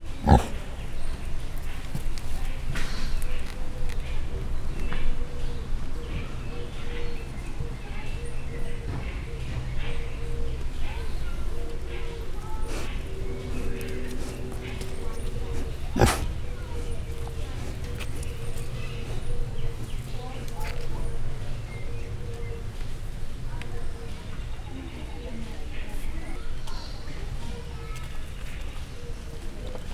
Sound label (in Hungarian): nincs újanap alatt, vietnámi csüngőhasú sertés; Győri Zoo 2014